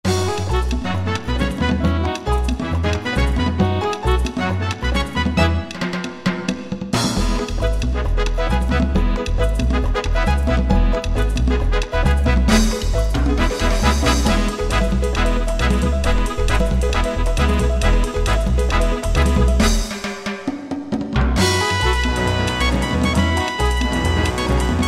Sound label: Merengue, Yamaha QY70 Preset Styles